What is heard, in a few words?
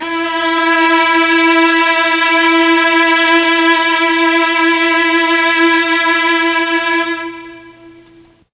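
E2 violins, mellotron; CHMB 3 VLNS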